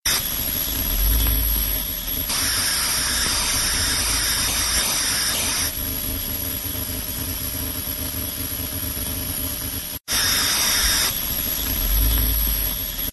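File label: Screen Noise; The Child of Slendrina; Android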